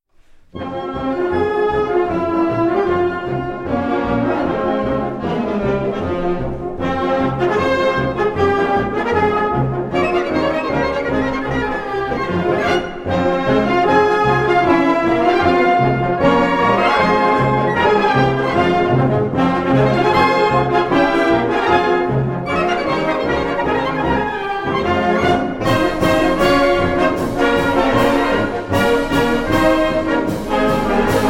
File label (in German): Ungarischer Tanz Nr 5